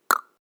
Zunge

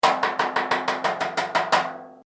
Muelleimertonne Asche

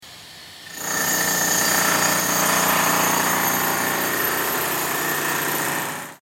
Schlaghammer

